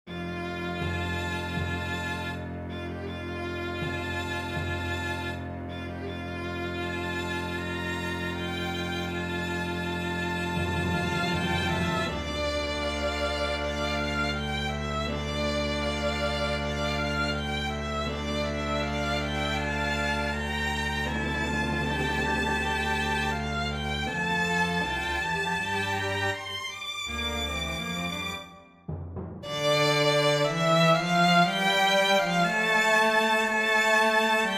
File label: Dance for String Orchestra